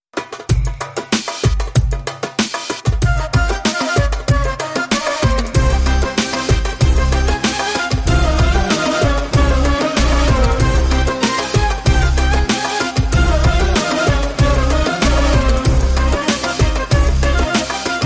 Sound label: Arabesk (Modern Remix)